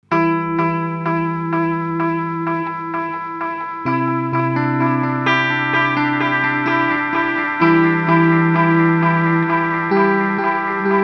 Earthquaker Devices Disaster Transport mix max mod speed fast mod mode bend